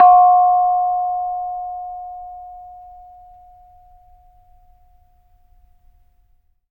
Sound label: BONANG F5; Spectrasonics; Heart of Asia